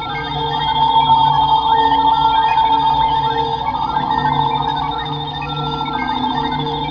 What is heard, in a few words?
amb lab